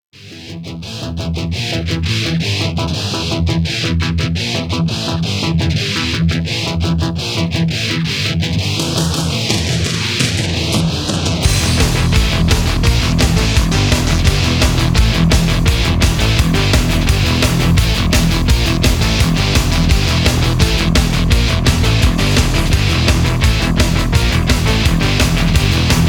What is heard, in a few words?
instrumental